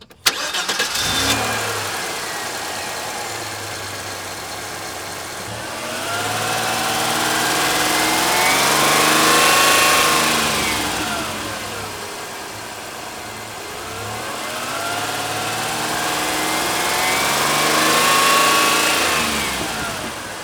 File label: cars